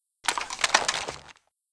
famas inspect, weapons; czero opforce

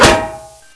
shield bonk; czero opforce

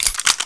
elite right clip in; czero opforce

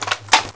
mp5 clip in, weapons; csof

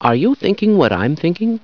startle, hostage reactions; czero opforce

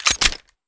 usp slide back, weapons; csof

